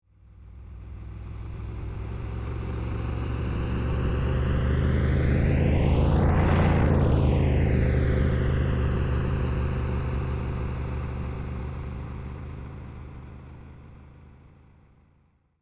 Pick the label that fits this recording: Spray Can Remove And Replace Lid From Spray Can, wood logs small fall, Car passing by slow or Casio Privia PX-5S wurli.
Car passing by slow